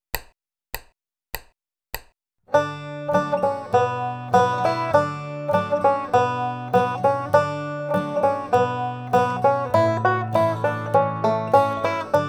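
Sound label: single string style, banjo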